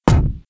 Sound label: Feet; Playable Tutorial Level